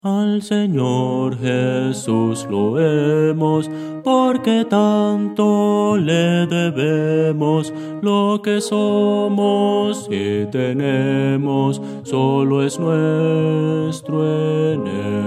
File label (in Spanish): bajo